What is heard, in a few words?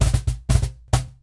Cajon Fill; musicradar